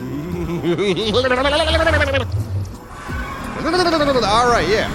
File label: nether portal noises